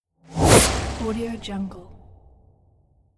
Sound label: big whoosh